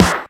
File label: Snares; Vengeance Samples